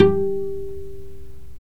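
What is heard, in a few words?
strings violoncello pizzicato, Chamber; Roland L-CD702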